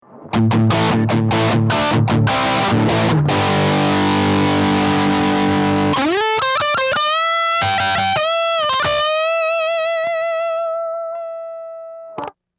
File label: Greco Dist